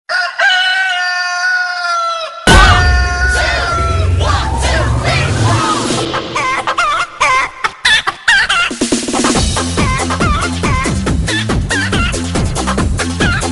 Chicken Ringtone

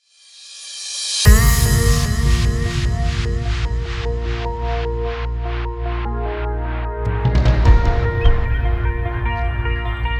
Hardstyle

